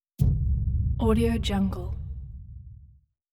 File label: short dull wooden impact